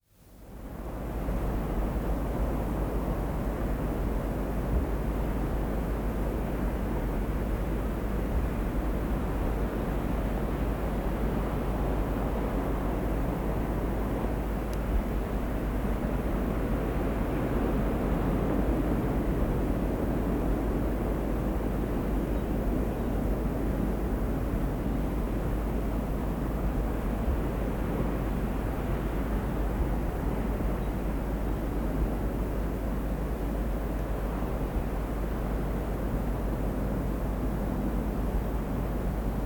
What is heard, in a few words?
High In the Mountains; Dr. Sound Effects; 210 Nature Sounds - 20 Hours of Relaxing Natural Ambiences for Meditation and Sleep